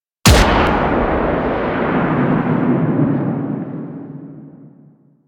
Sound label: Fall Down Gunshot; Warframe; NineteenNinetyNine; Stage12PartyOfALifetime